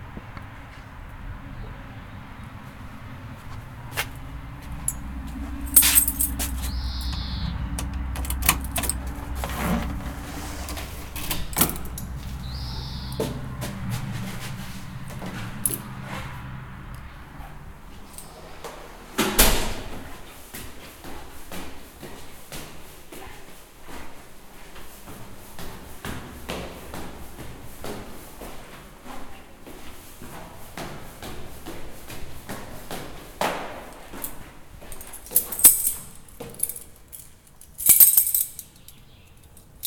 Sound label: into house into flat; Entering the six flat apparment house, going up the stairs and then entering a flat. The time is midsummer around 9 or 10 am.